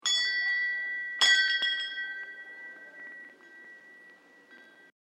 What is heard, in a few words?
Ship Bell Two Rings; Boats; Vehicles